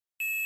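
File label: Medium Button Beep Short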